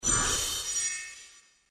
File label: Enter Snd